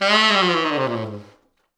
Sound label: T Sax falls, Horns Sax